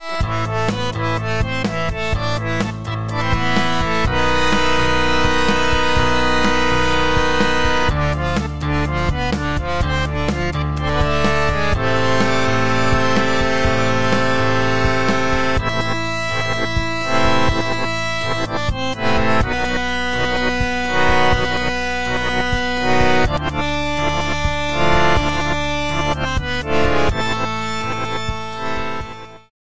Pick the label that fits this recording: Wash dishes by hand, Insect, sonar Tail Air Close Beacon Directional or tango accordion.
tango accordion